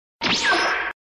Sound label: punch sound effect